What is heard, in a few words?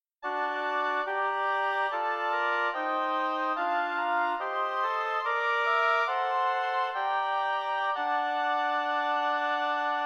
lyrical oboe quartet; no3 bach